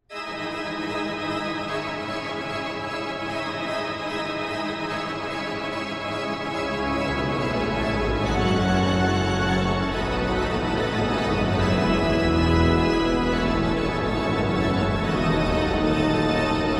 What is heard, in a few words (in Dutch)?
Toccata orgel